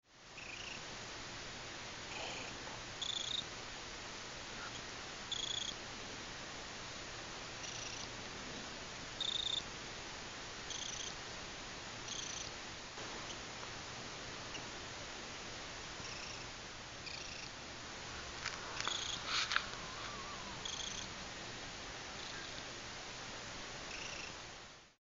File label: Cricket